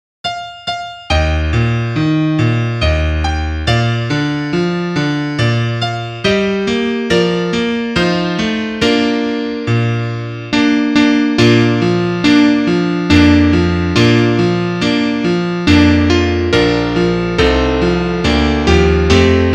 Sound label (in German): Klavier; guten abend gut nacht